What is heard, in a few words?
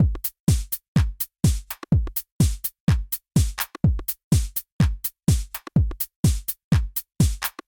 Techno